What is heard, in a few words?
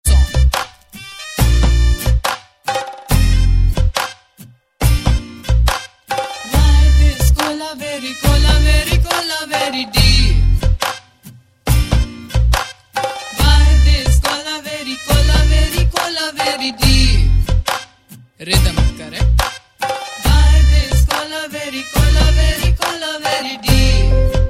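instrumental, dance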